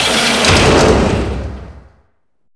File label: GAME SHUTDOWN; maimai